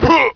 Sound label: land; PC Gamer IT CD 41; Daikatana; superfly